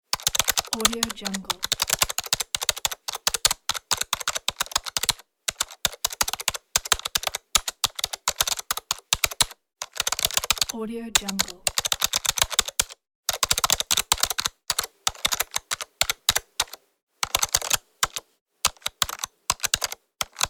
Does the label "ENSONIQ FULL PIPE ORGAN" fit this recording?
No